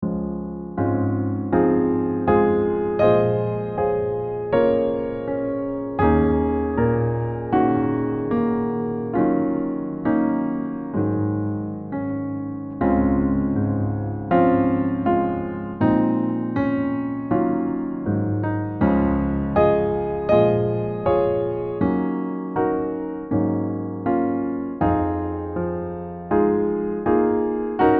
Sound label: piano solo; Were You There